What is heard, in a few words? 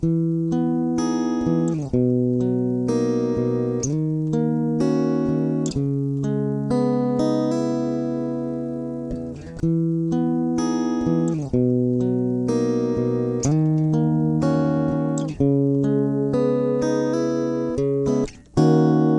guitar